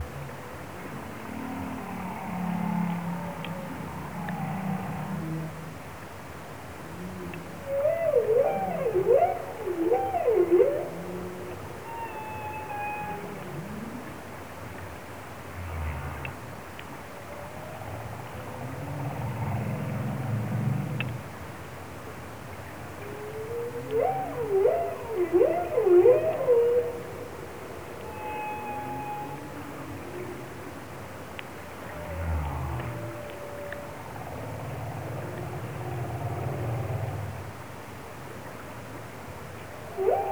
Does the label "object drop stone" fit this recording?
No